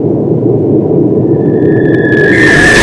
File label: grenade effect; cstrike; mw2